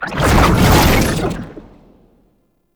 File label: Perfect Heavy Attack Activate, Weapons Melee